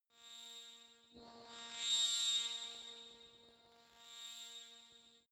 space ringing crispy flare; Energy; energie

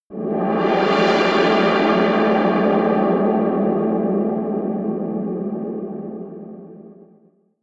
reverby spacy scrapes, Hits/Impacts; whooshes rises

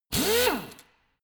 impactor soft, bohrer

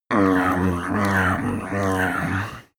male eat feral; koerpergeraeusche; menschen; soundpool

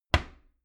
door wood close regular

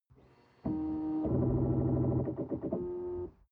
sports car ext ignition onoff; verkehr-transport

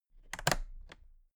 toolbox latches close soft

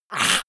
monster choking high; comedy-cartoon; soundpool

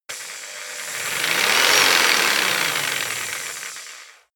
jigsaw engine medium fast short; maschinen; saege

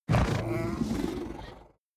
fishman grunt; kreaturen

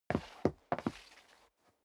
step sneaker wood parquet scuff; schritte

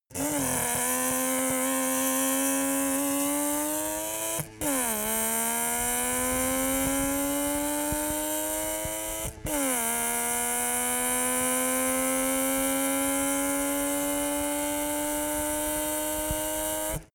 car saab 92 accelerate exhaust

